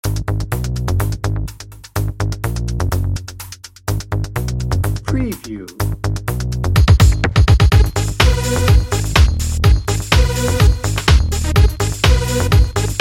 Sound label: party beat bed